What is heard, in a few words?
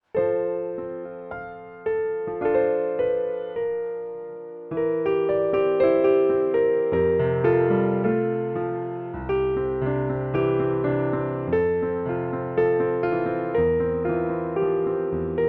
piano Mozart Ave Verum for baby sleep